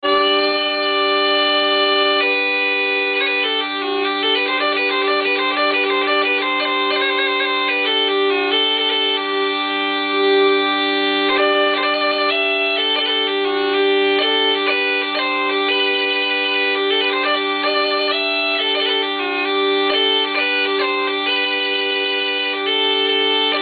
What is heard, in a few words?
bagpipes mulitanky